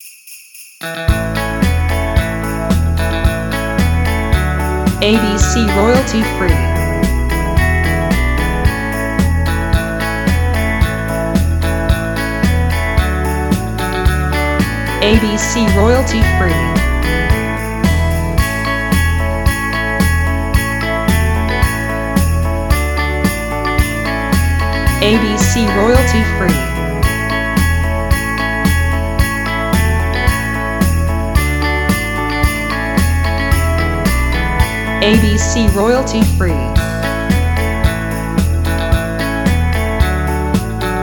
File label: Jingle Bells Guitar